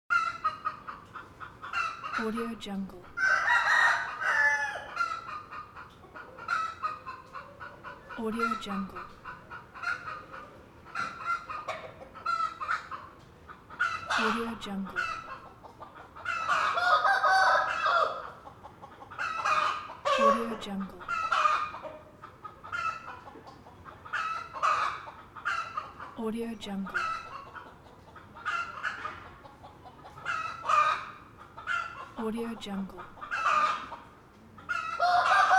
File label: chickens rooster ambience